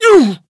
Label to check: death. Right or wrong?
Right